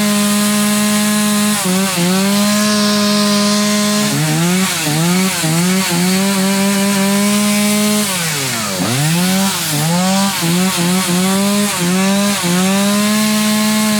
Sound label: chainsaw Gas; Tools; Barotrauma; Barotraumatic